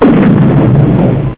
shoot; spacehulk

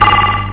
radar; spacehulk

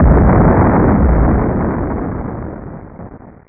woosh; spacehulk

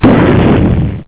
hit; spacehulk

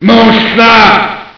eat; spacehulk

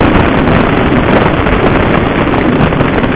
fire; spacehulk

